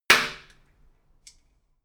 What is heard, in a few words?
party popper; hits-explosionen